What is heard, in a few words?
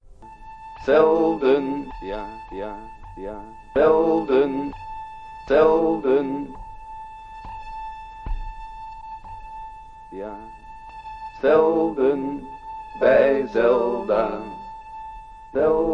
singing lofi, MALE SINGING; zelda 1988